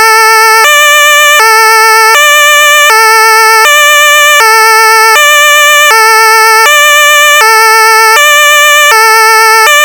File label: pintsch bamag DIN Land